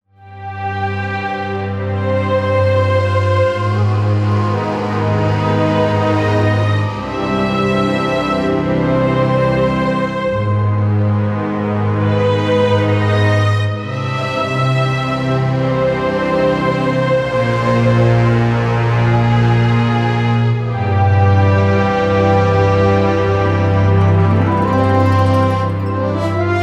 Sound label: 70bpm; Mekka; NabatäerGebäude